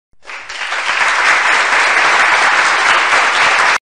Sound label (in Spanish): Aplausos Efecto de sonido